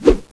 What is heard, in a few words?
knife slash, swords; minecraft